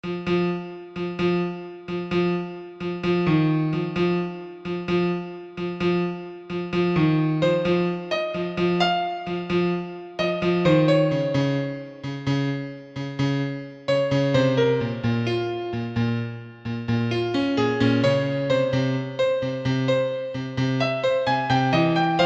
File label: piano